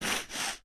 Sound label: idle sniff short; smite; god ganesha skin11